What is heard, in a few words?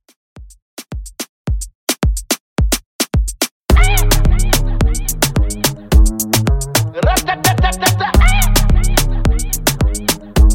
Intro Outro Clean Dirty 108 Bpm